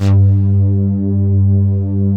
SYNTH SYMPH; Pro-Rec - Synthsonic; Z1 PADS

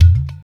Chatan Hit, Percussion; Ambient Grooves

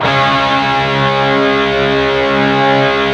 PRS PWR CHRD D 3 R, Guitars

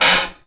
BOX, Anime Sound FX; COMIC

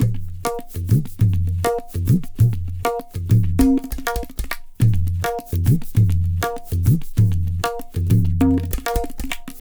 Tabla, 100 BPM; Zero-G; Pure Tabla

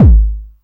DIST. KICK

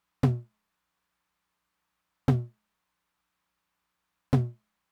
Low Tom, Roland TR-909; drum machine; Roland TR-909 Ableton Project

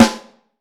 HHT, Vintage Snare Drum; Q Up Arts; Johnny.C's Vintage Snare Drum; 5X14 RODG

